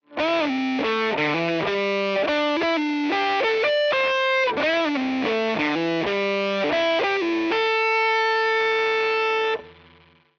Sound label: solo vintage; ampsims; tse r47